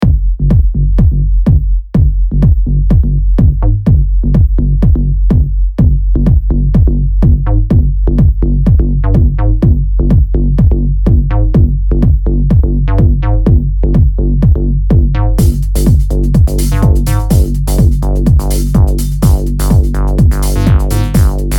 tabla, full of African and Asian percussion, tablas, bongos, congas; seasoned with a massive use of shakers and maracas; Eastern Tabla; Blind Frog Records